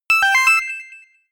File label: notification pager; kommunikation; signale